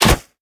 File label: Fishmen Garpun Attack; we are the dwarves gamerip 2016